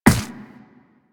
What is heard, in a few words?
Run Ice, Footsteps; Warframe; Operator